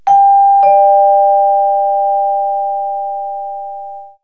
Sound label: Vibraphone Doorbell; Music; KABOOM! - Wild and Wacky Sound Software